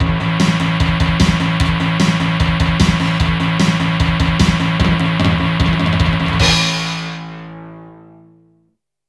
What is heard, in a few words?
Stage Clear; Ninja Commando; Neo Geo CD